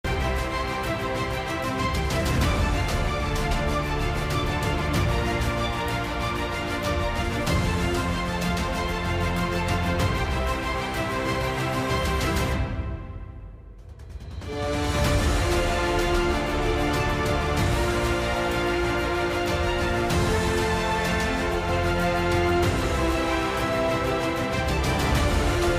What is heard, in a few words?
cinematic orchestra with epic drums